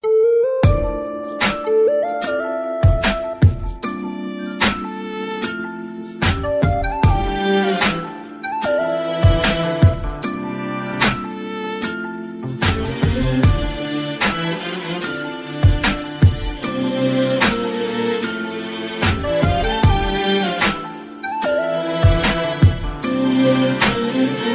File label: Very Beautiful Arabic Ringtone; ringtones